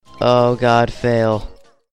boy fail

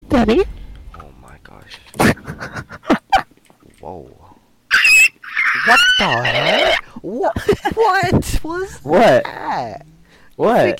weird noices

